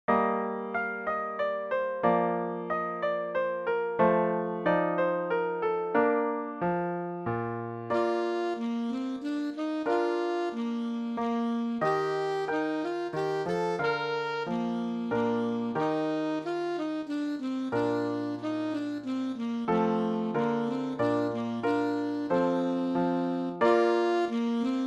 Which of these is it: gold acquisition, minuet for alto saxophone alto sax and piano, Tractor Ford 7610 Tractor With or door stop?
minuet for alto saxophone alto sax and piano